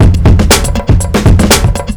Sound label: chemical 120, loops, 120bpm